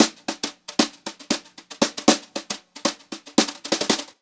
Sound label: Brushed Drum Loops, JAZZY, 116